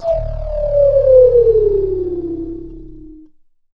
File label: WHISTLZ, HUMAN SYN; ILIO; Vocal Planet VOL-3; Jazz & FX